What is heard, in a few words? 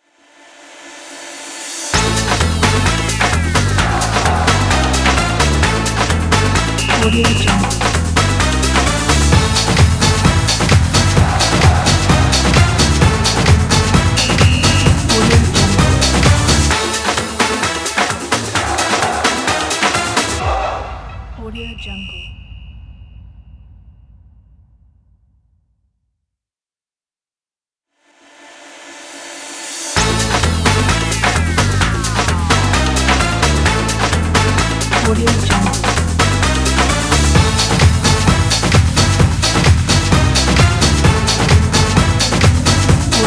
sport logo intro